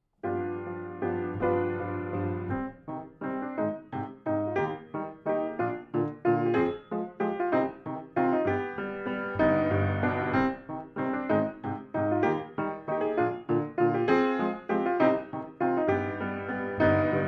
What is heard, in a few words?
Instrumental; theater